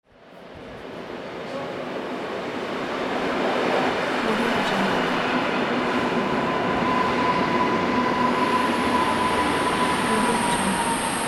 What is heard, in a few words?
metro train